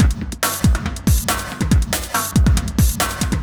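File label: industrial 140 kit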